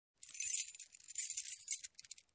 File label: Keys tinkling